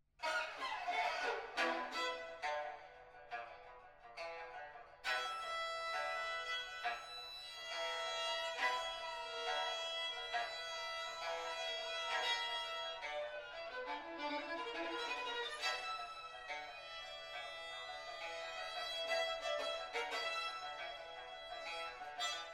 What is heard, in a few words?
violin & pipa